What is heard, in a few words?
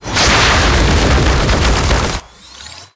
Drone Attack; blue archive